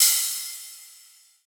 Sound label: cymbal sizzle, Hip-Hop; Drum One Shots; SD CAICOS Hip-Hop Sample Pack; Slate